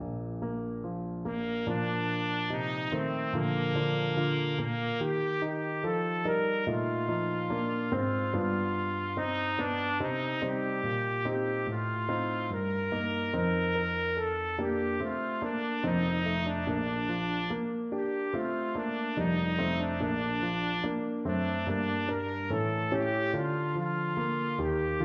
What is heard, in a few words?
rest in the lord trumpet